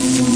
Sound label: clicky things, Clicky Gadget Things